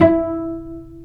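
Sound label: strings cello pizzicato, dry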